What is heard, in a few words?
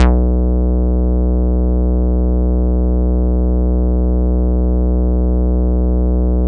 Synths BASS